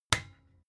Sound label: desk lamp off hard